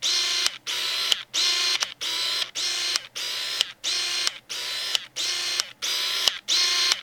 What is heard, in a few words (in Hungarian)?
af hang, Pentax FA 77mm f1.8 Limited